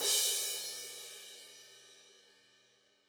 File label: Crash, cymbal; apulSoft apTrigga2; Multi-Velocity Cymbal Samples